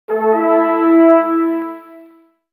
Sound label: Horn Fanfare; Kingdoms and Castles Merchants and Ports